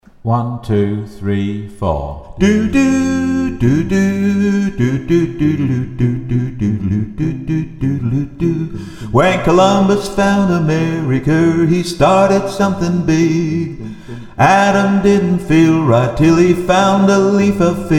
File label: TENOR